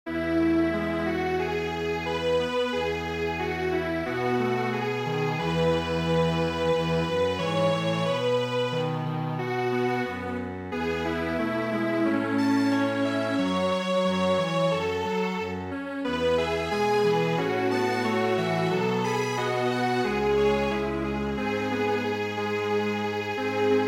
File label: choo choo train Strings Quartet